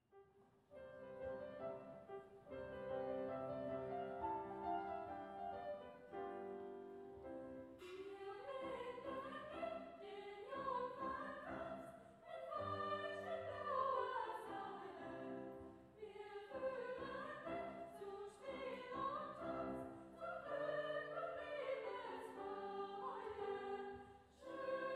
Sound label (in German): Chor der Brautjungfern; C. M. v. Weber; Musikdarbietungen bei der Kunstnacht